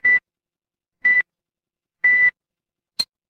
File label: ui countdown